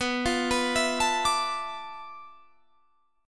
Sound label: D Minor 6th Add 9th B